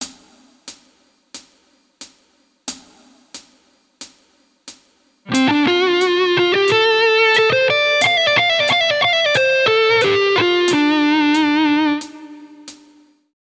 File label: Lickbag, Guitar; Guitar Techniques 2018 Issue 289 December